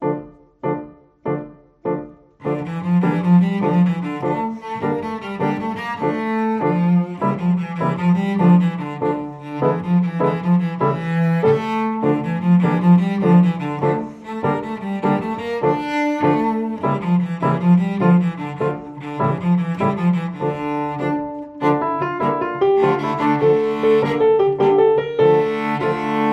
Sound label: Tarantella